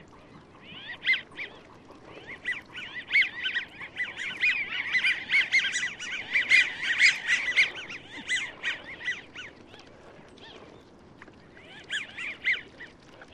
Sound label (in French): canard a front blanc, chant